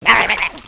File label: Voices Talking Hands - HAND PAIN